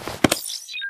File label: crane embark; Titanfall 2